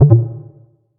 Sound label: Evade Down